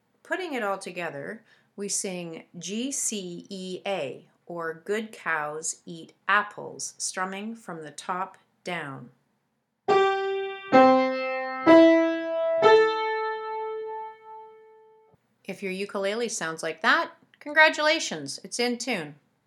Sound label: GCEA Putting it together